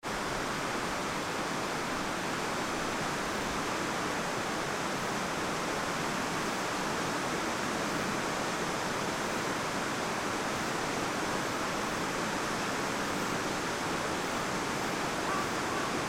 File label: river